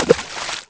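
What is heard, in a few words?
splash small; envrn; The Elder Scrolls III Morrowind GOTY; XBOX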